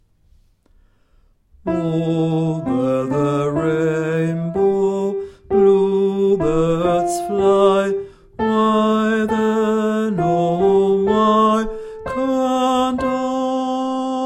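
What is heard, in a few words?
soprano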